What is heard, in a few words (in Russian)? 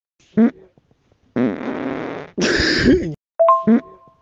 zvuki prirody